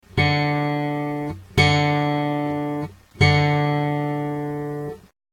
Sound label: bass tuner D